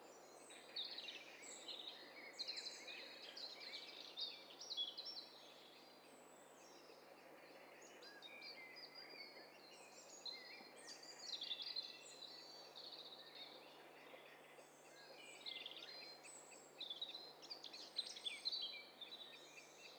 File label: STEREO BIRD COCKTAIL PARTY